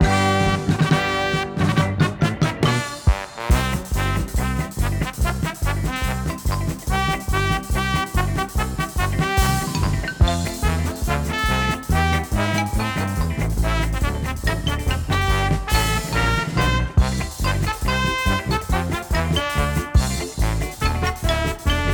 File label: Cannon Pan Out; Go Play Circus Star; Wii